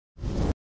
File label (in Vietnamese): am thanh chuyen canh nhe nhang